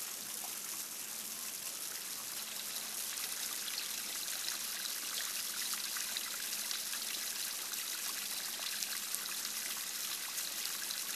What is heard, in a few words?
trickle fountain; corporate; Titanfall 2